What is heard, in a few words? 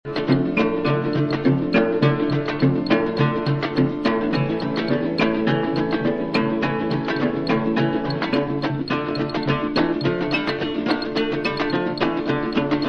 instrumental quartet; west african